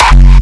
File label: Kick, Raw-Hardstyle; raw hardstyle kick pack 1